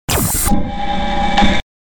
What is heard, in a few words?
Zap Beam; DJ sounds